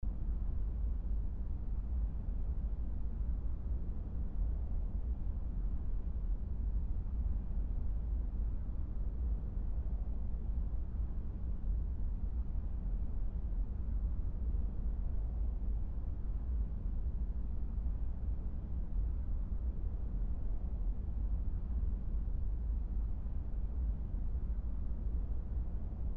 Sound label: Courtroom Ambience